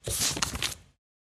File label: wood tile flip back